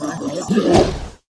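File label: Goblin Mage Attack; Seer; GamigoNA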